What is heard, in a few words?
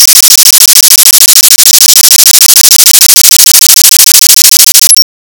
beach wave